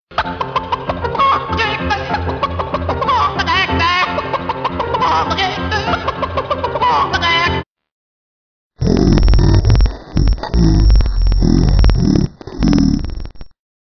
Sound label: chicken diva funny; animals